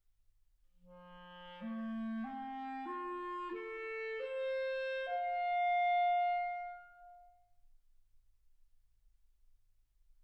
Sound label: clarinet